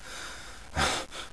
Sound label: breathe, player